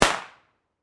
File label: Firecracker Bang, Fire, Firework, Pop; BlastWave